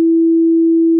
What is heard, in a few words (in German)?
Ton E1